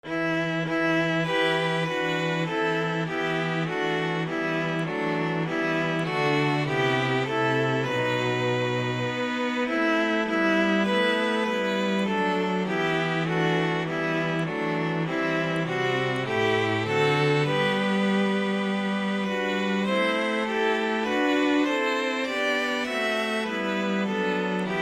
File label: god rest, string quartet